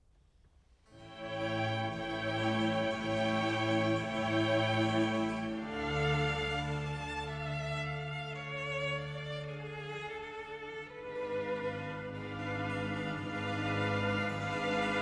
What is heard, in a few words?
2 trumpets, strings & continuo; Largo; Double Trumpet Concerto; C major; RV 537; Trumpet Concertos